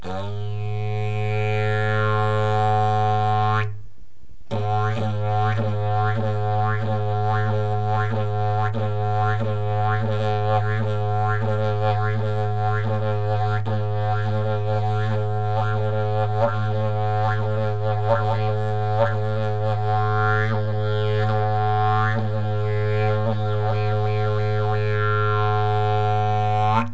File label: didgeridoo; jilm skritek